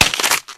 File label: body medium break, physics; Source Engine; video game